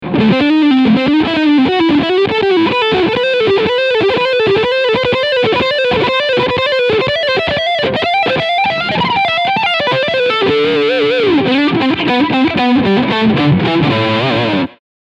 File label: Leadsound Front